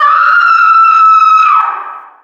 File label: female distant scream, witch, angry; zombie plague